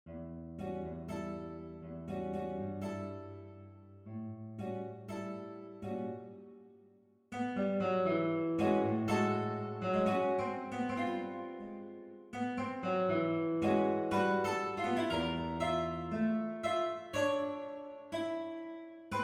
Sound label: Guitar